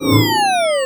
Fall Down

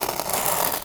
Rubbing Scratching Papier Verre Fin

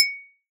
synth

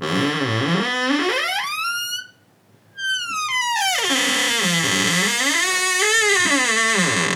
Creaky Door

